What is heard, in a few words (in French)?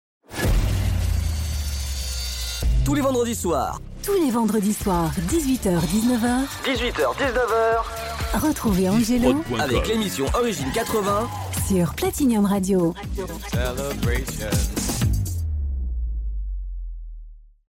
Radio Jingle Origine 80; Platinium